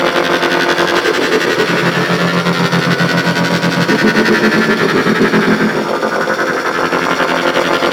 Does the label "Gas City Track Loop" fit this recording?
Yes